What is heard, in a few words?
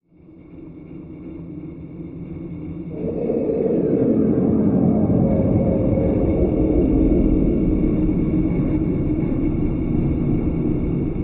Moving Drone heavy low tone with various rise/falls, vocal accents